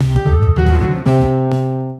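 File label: jazzy sound for failed attempt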